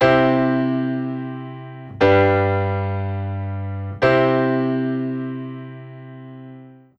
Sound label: bow